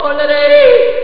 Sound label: yodel; speech